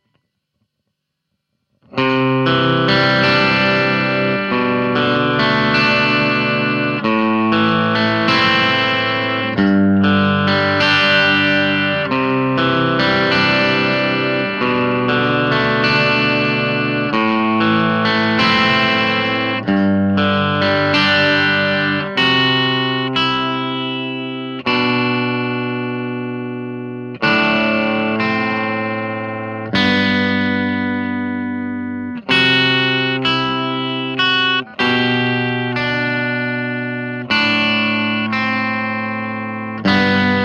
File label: arp amp; mxr; superbadass